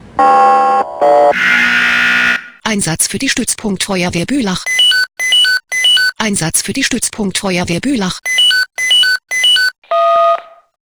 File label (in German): SMS Alarmierungstone; FW Buelach